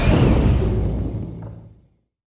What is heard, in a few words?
bladefury stop; weapons; dota; juggernaut